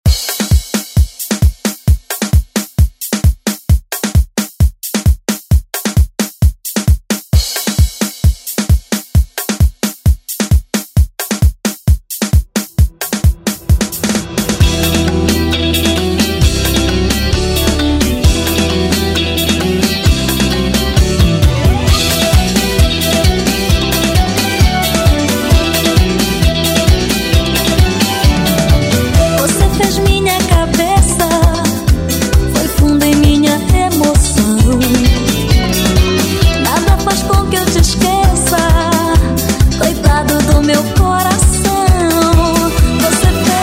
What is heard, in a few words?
Latin Redrum